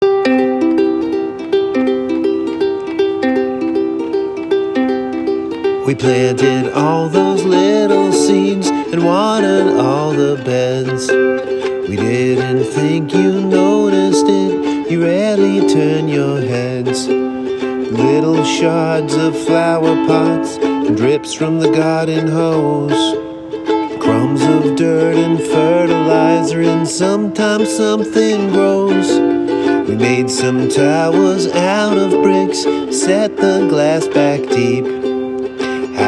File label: etude for 3 ukuleles and 1 cricket